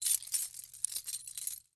Key Movement, Automobiles; 1001 Sound Effects

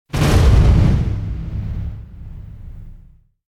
Whoosh Low Hitting; Hollywood Edge; sound designer's toolkit v2

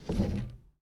Foosball Forward Spin

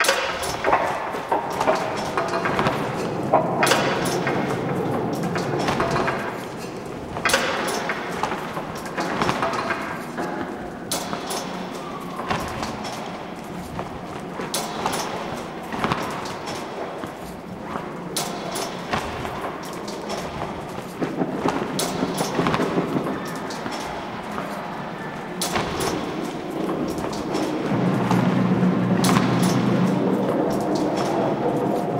construct ambience mono; Pitch Black - A Detective's Demise

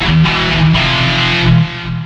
Pickup Guitar Riff; SFX Kit; Pickups

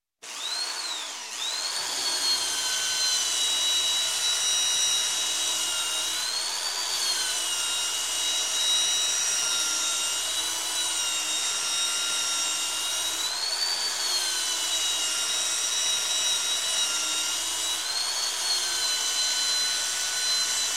Construction, Sander Start, Sand, Stop; Sound Ideas 2000 Series

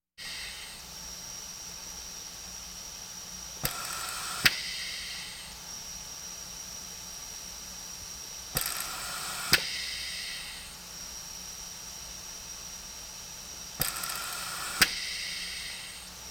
Hospital effects

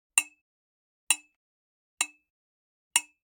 Generic Click Glass Tiny

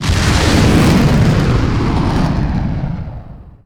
Plane Jet Afterburner; Airplanes

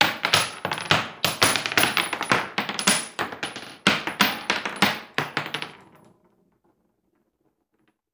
Bullet Shell; Sound Ideas; Guns

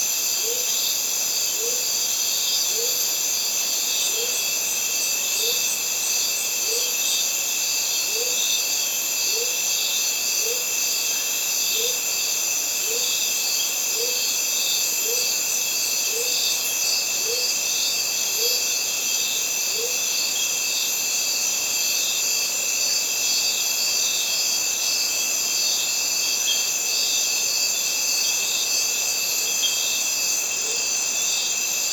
Forest evening; Inquisitor 2

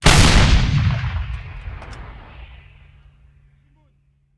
Artillery Cannon Artillery; Sound Ideas; Guns

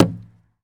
Baseball Hit Wall

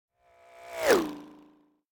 Space Ship By - Space Motorcycle; Hollywood Edge; sound designer's toolkit v2

